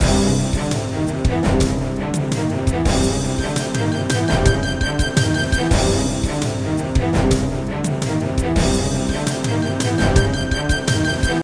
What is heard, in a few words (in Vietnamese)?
Nhac cho cau tra loi hoi hop cang thang